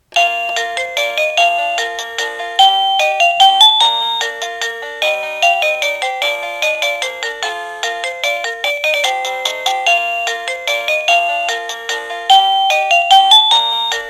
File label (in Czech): melodie